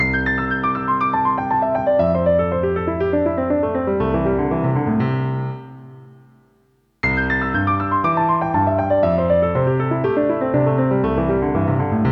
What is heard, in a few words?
Cascade Exercise